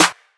good clap single shot d sharp key, Royality free clap single hit tuned to the D# note; single hits one shots